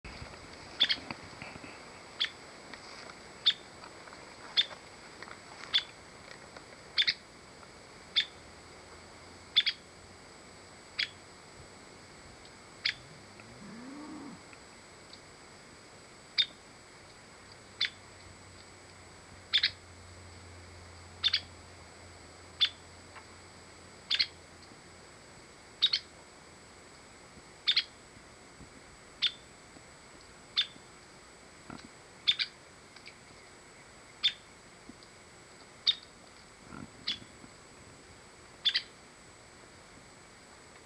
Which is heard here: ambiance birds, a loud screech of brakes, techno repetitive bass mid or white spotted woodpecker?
white spotted woodpecker